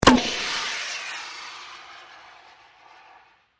Flare Launcher, Flare Launcher Shoot Military Nautical Emergency; Flame Sound Effects